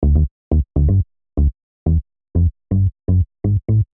Bass Spire Deep House

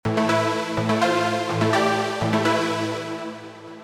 Lead Melodic Techno Hive

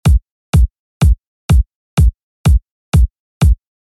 Kick Loops Techno House

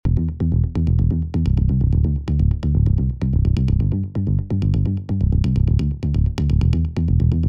Bass edm Vital

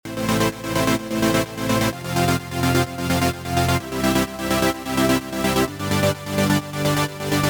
Edm Chords

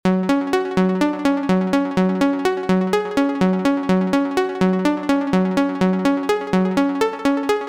Arp Melodic Techno

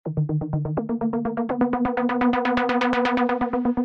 Bass Melodic Techno Hive

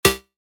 Synth Samples trance

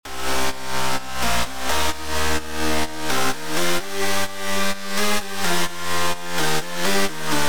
Lead edm Spire

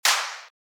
Clap Trance

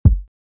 Edm Soft Kick; EMP

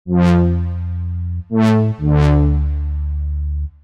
Vital Synth Techno House

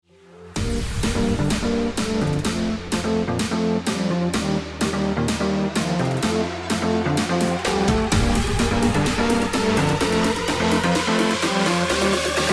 Edm, electronic music; vital electronic music box

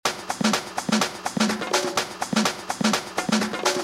Perc Loop Techno House; Toolkit

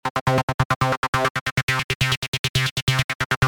Acid Seq Hive Trance

